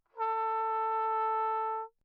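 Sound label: trumpet mute ohne; Perinétventiltrompete; Lechner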